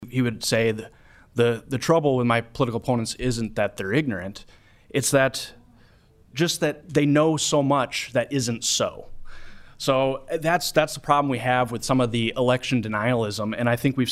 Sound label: Crowd